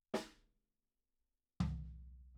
Kick

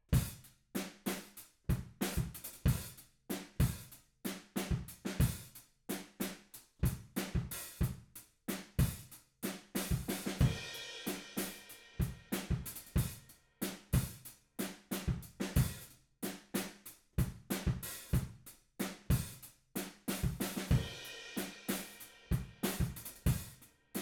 Room

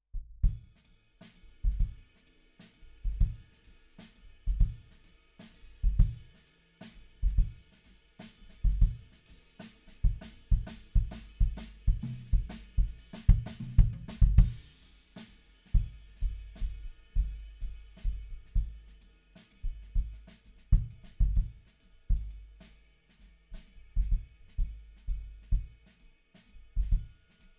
Kick In

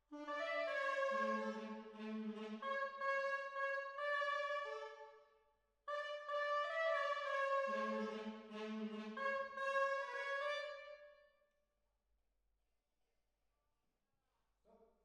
Hall, Jazz for piano

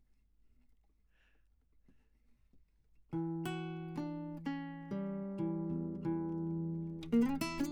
Classic Guitar

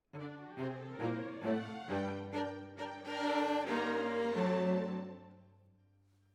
Cellos

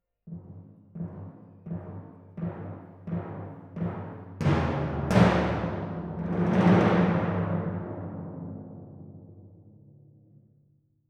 Timp

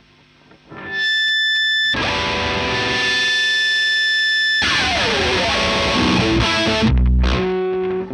Marshal Gtr Line

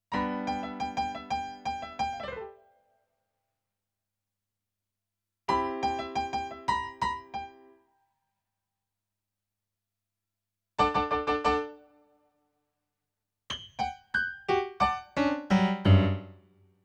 PIANO, RNR for piano

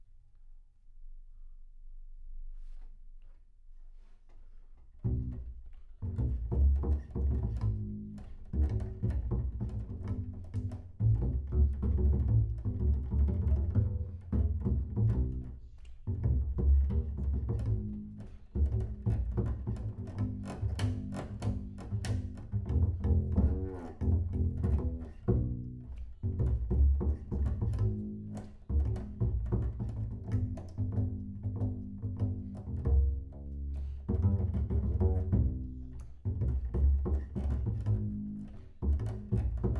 Bass Room

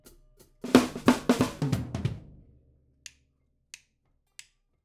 OHs

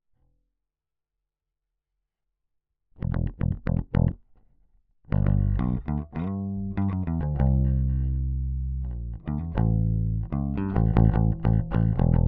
Bass

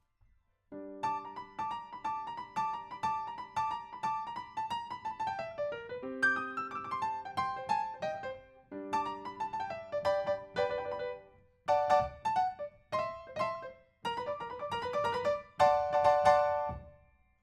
Piano, RNR for piano

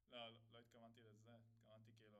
Tom

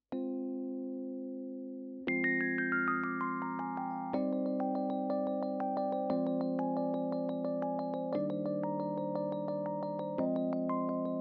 Rhodes

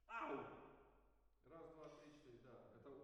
MKH AB, piano

